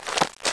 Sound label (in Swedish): skog, skogsljud; xash3d fwgs